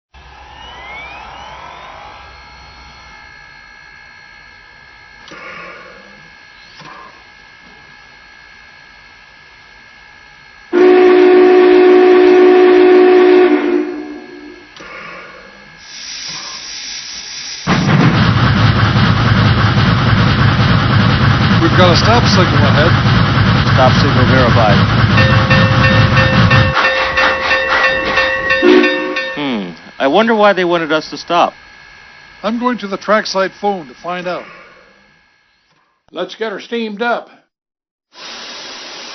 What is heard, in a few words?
Big Boy COAL